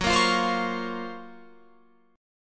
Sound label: F sharp Major Sharp 11th, Guitar, Open F; F#M#11 Chord; strummed; 18,17,17,19,20,20